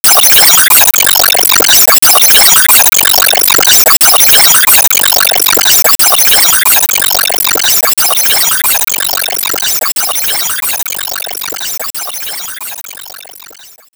Enemy Temple Active Loop; disney star smash android ios mobile gamerip 2020

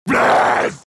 Monster Yell

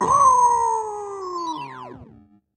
Death; Caffiend Caelan

